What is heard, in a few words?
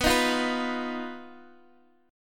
Eb Suspended 2nd Sharp 5th, B